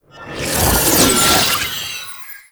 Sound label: Rune Cast C; Warframes; Uriel; Summon Vythelas